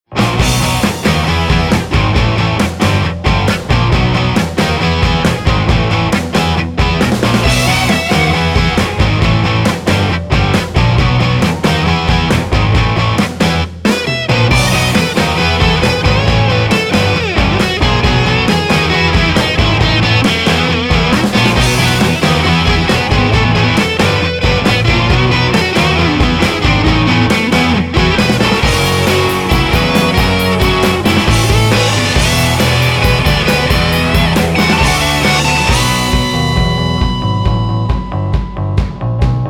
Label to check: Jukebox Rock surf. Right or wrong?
Right